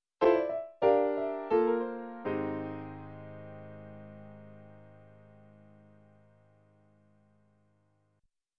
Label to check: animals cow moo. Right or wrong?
Wrong